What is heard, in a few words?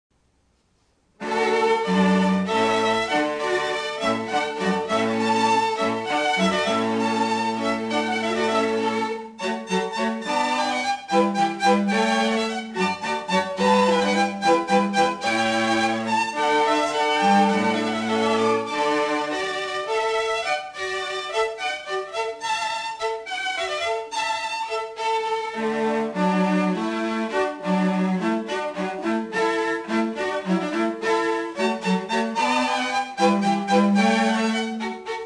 Hornpipe in D, string quartet; Handel; Water Music